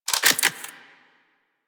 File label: Pistol Reload B; Sybaris